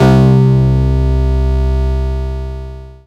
Basses C; Vengeance Samples

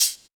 Closed Hihat, Cymbals; Closed Hihats

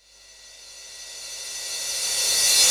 Reverse Crash; Cymbals; Vengeance Samples

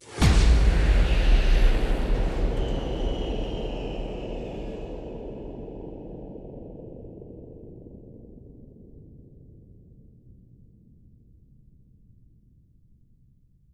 bassdrum reverb FX kick; Vengeance Samples

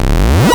Arcade Riffs

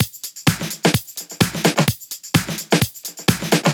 Drumloops 128BPM

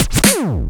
Scratchy, FX; Vengeance Samples

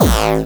Bassdrums Hard Kicks; Vengeance Samples

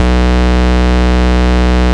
Root B, Ultimate Bass; Vengeance Samples

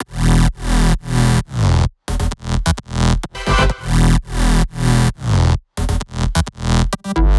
128BPM Bass Root E; Vengeance Samples; Machine Kit

